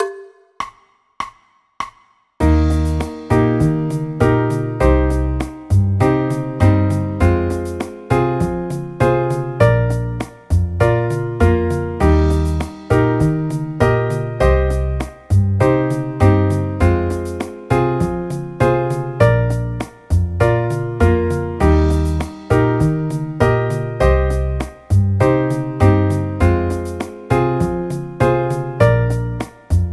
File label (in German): eb dur akkordverbindungen